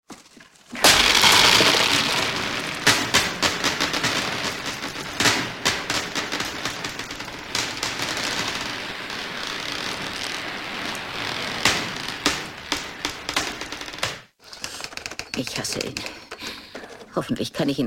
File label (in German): Murmeln